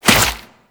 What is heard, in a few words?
Infested Tendril Step, movement; Zeke; Warframe; Infested Lich